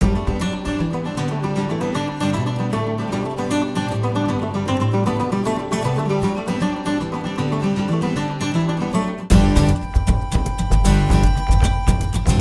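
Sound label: Shooting gallery post loop; Gnarled Forest; lego universe macos windows gamerip 2010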